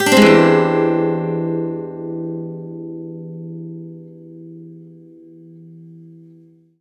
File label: E 7 #5 upstroke, Acoustic Guitar; Sonic Foundry (Sony Creative Software); Songwriter Acoustic Guitar Companion; One Shot Chords